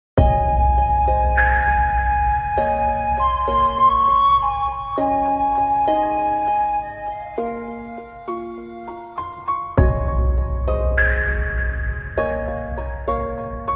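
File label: background music crossfade; Invaxion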